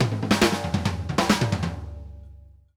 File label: ALL ARND, drum fills; Sampleheads - New York City Drumworks VOL-1